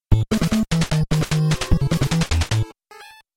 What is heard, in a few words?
Game Over; kid dracula